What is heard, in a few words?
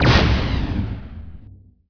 Pulse can; machines